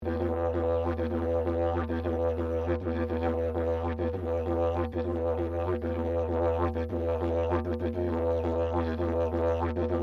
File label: Didgeridoo Traditional Straight Rhythmic Drone Loop, Traditional Australian; Traditional Australian Aborigine didgeridoo designs; Miscellaneous Musical